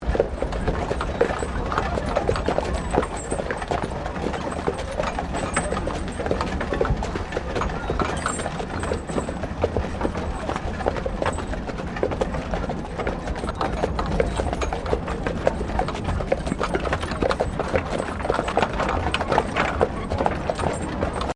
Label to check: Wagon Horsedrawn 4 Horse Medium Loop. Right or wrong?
Right